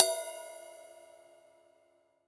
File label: crash soft